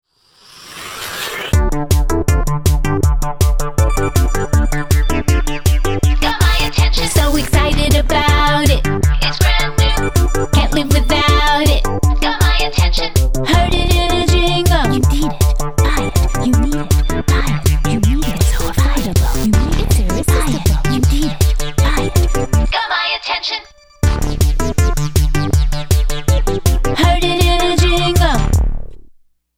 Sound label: JINGLE